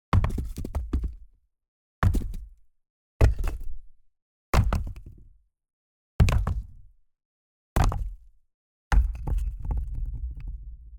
Woodshed - Rock and Wood Large Impacts - 1 Meter. Rock, Wood, Large, Impact, Roll; Mattia Cellotto; Rocks Momentum